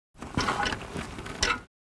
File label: Motorbike kickstand being retracted; vehicle